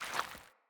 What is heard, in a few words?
Stuck Sludge Foley Move; Encounters; Animal Rescue